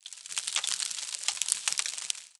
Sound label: spore tree pitter patter; trees; dfcaverns